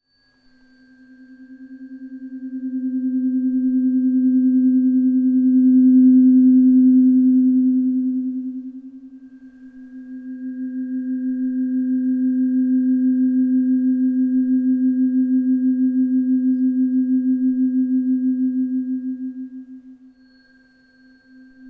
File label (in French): set brain tuner diapasons alpha theta delta gamma beta